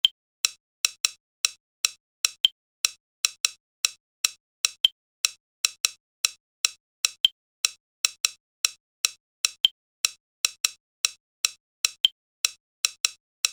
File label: Tick Phase